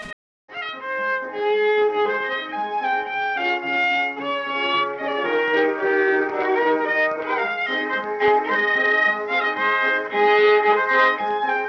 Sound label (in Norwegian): MUSIKK; amerikanorsk; einar haugen